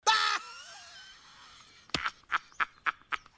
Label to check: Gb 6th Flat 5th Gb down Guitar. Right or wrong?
Wrong